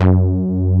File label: SLAM F#2; Best Service Alpha Dance vol.2; SLAM SEQ.2